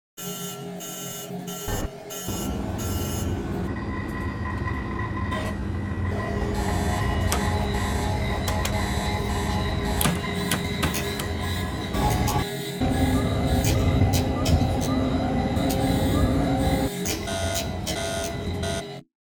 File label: alarm chernobyl